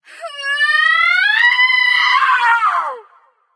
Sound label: battlecry light; smite; voicepack; hel